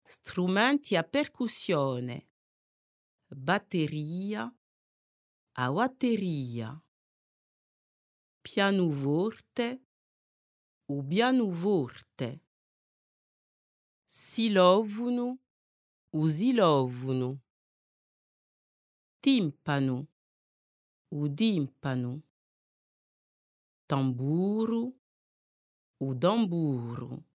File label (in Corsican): 4 strumenti a percussione